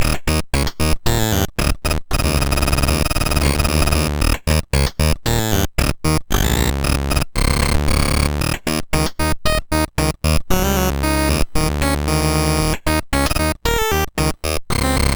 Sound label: sid MOS6581R4, GAMES; Break Dance